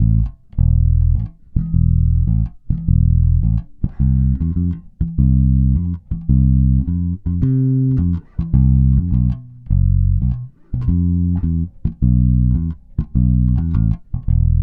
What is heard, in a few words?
Brazilian Bass 105 A minor